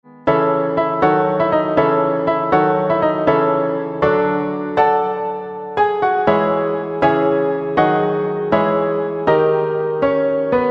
piano; sequel

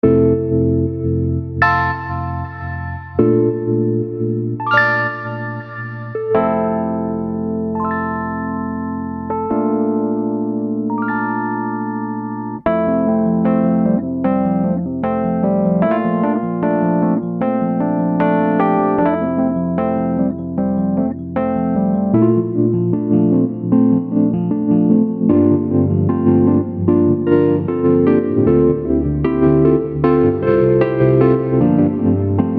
ezkeys electrics

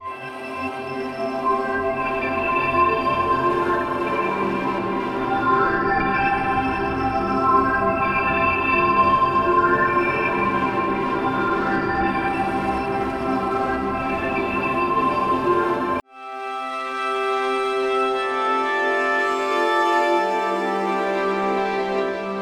Augmented Piano Athmo Pad

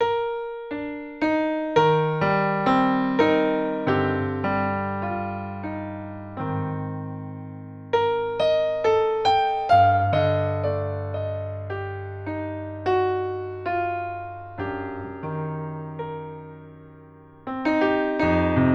Bechstein Grandeur 120bpm

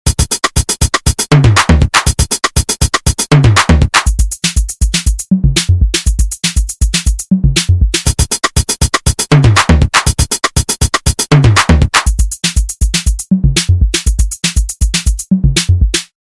Mini Drumz; dsk

